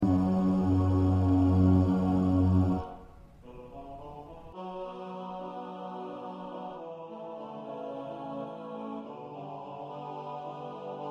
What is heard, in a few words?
Bass